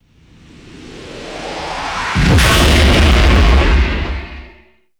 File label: synthesized descending swoosh and crash; swooshes